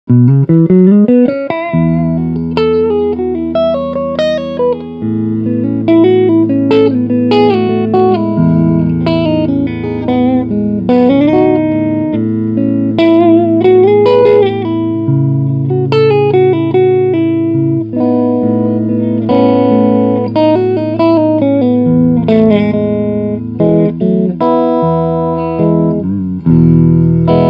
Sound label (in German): Bluesy im Bass Channel